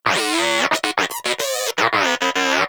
Inquisitive Vocal Studious; Pets; Warframe; Moa

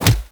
Melee Impact Punch; Warframe; Purgatory Warrior

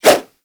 Nunchaku Whoosh; Warframe; Tenno

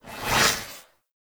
Mod Cycle; Riven Mod

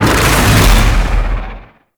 Void Dragon Chomp; Enemies; Abilities; Warframe; Duviri

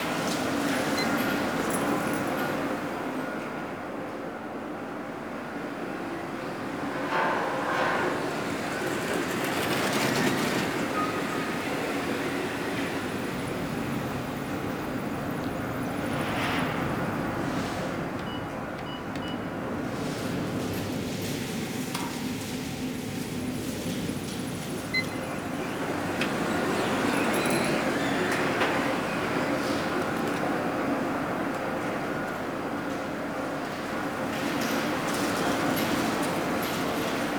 Interior Hub Large Bed Loop, Ambience; Warframe; Nineteen Ninety Nine

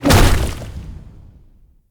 Grunt Swamp Cabbage Explo; Enemy; Combat; Characters; Corrupted Sproutfolk

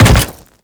Step Default; Warframe; Grineer Leto

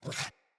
Kubrow Puppy Idle Snort

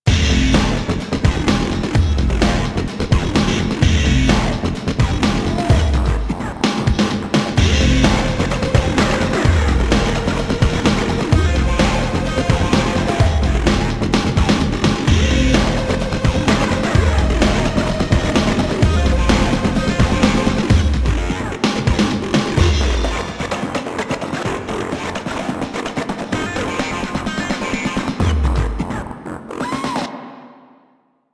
Music Title; Warframe; Caliber Chicks Minigame

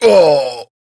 Death; New War; Kahl

